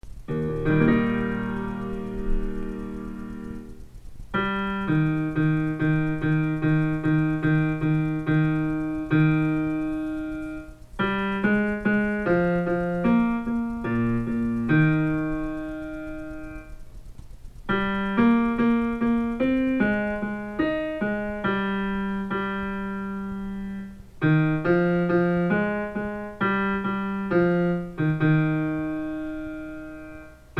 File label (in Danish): bas ( tenor )